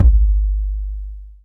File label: BD, BASS-DRM; EastWest; Dance Mega Hip Hop Electro